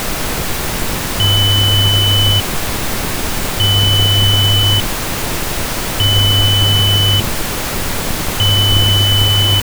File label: tones plus noise